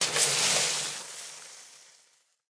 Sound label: extinguish campfire; STALKER; IWP